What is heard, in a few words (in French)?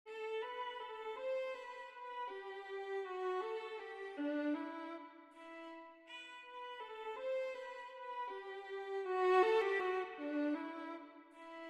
Violon; Les Valkyries